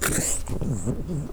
zombies misc idle

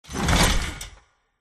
movement foley; gow; silverback

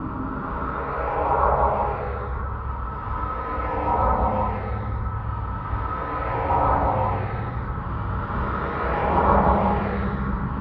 tone quiet, radar

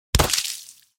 bullet impact flesh

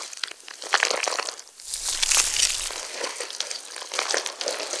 worm idle, giant worm

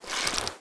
bow pullback; bow deerhunter

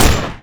patriot fire; weapons

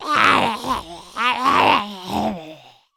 idle, zomb kid; nmr

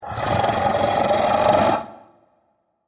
hiss; sporecarrier

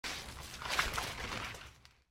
rise; npc; sporecarrier

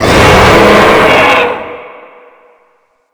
shout boss; thor

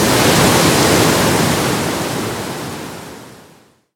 wind buff

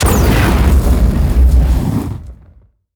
flamethrower start

